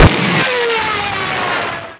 shoot; PC Gamer IT CD 48; Big bang

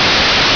NOISE

